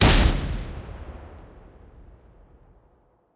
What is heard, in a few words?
shotgun fire; lancer weapon fx